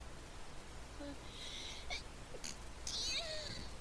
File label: sneeze